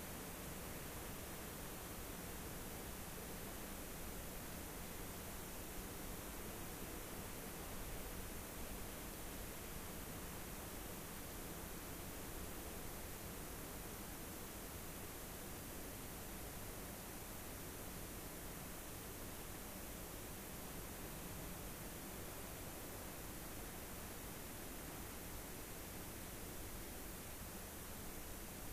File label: rayol farm; SEAMED